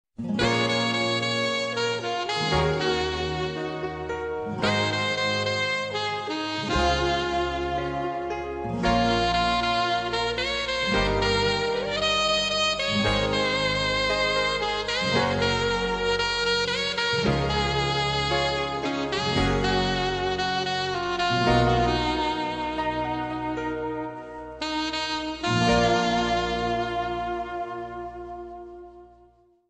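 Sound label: saxophone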